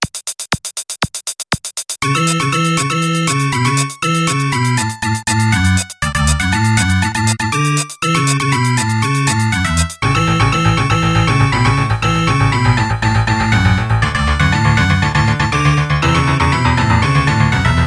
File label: ringtone